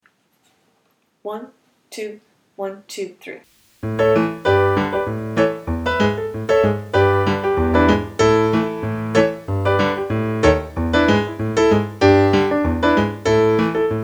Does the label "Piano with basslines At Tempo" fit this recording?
Yes